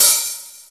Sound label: SY35, Yamaha Sy35, Drums